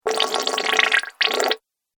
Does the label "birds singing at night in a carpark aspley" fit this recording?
No